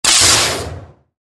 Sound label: saber block; Sabres Laser; Blocages